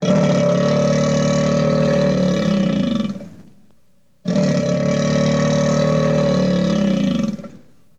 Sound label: Monster (crocodile snarl); Ed Edd n Eddy; Adromulus Pack